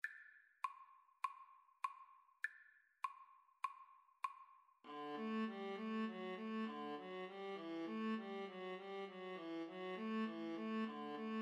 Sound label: viola, Viola Duet